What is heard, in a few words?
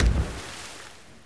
jetski; giants